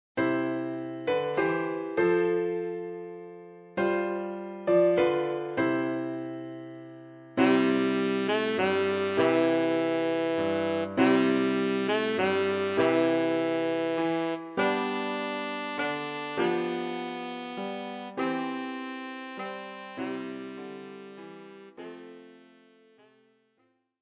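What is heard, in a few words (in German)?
Tenorsaxophon; Stille Nacht heilige Nacht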